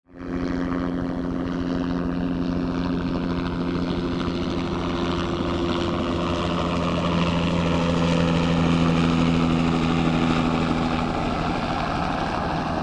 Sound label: helicopter passing by